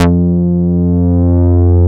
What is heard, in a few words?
Synth Bass; SYNTH-Basses